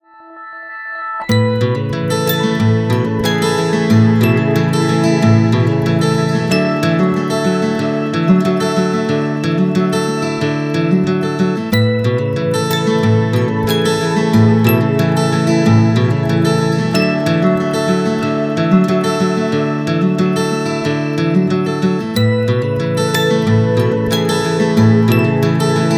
Readme Of Guitar, ringtones; Honor Pad X7

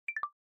power disconnected; Coolpad 1827

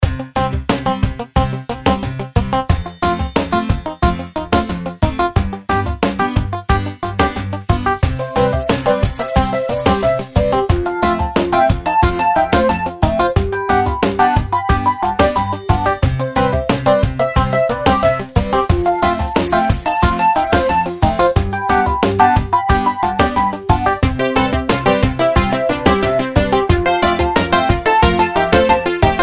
Rythem; Etuline

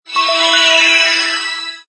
Shutdown; Danger Hiptop LX 2009

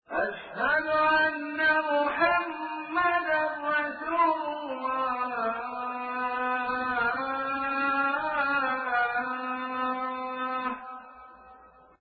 azan; Spreadtrum source code audio

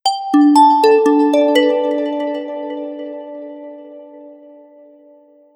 Bell; Gigaset; GS290

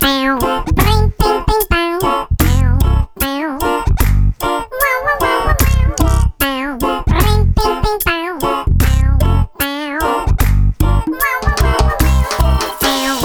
Playful, ringtones; LeEco; Le-2

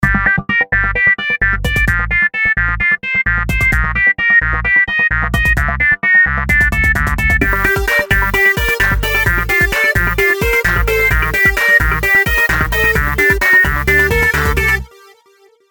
Beat, ringtones; MyPhone my96 DTV

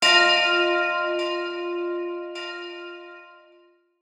Notification

